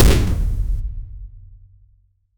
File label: heavy impact thud; physical